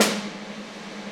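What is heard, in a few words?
Snare Hit Loop; Digital Sound Factory Virtuoso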